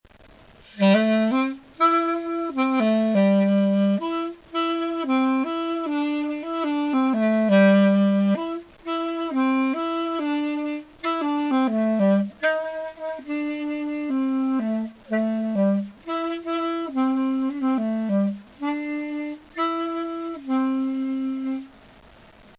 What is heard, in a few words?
TRAVELERS SAXOPHONE